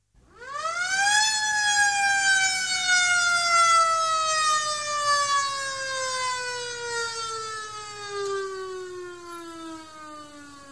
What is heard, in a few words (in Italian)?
SIRENA; trasporti; soccorso